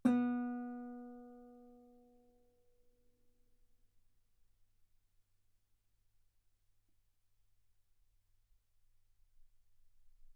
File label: Guitar B3